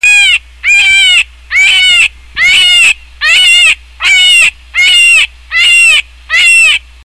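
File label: zayac golos, hare voice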